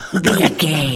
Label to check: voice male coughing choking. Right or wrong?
Right